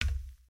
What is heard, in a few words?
Foot Steel Boot Walk, footsteps